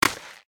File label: vine game catch; toontown-ceiling